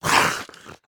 tem wizard dam gup; monster

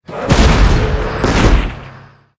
attack

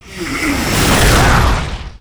scholar ghost atk; monster

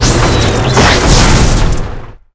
mech spider attack, monster

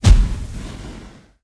skill explo

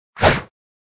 attk

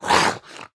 snake damage

